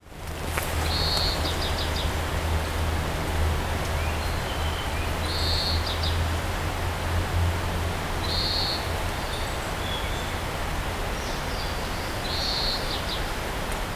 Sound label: kawarahiwa, bird song